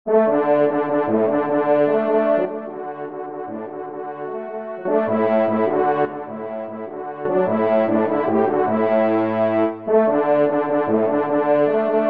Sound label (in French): Marche des Chasseurs d Alsace, Do Maj, trompe; F. Schann